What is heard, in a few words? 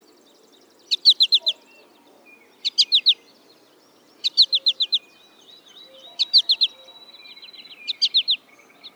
Spinus tristis; Chordata; LandBirds